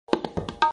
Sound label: tabla message ringtone